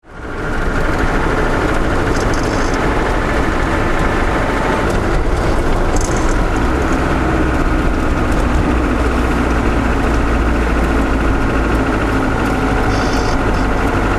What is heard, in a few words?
truck driving cabin noise